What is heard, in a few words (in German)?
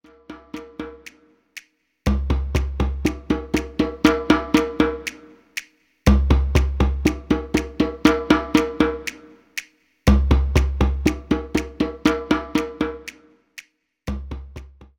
Warm up 4er medium, trommeln